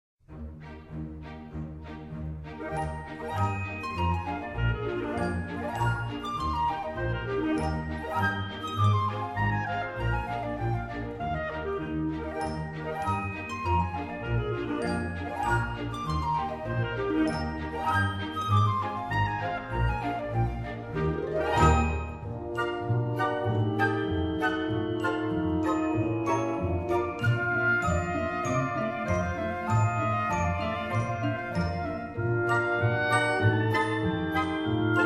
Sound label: Dance A Female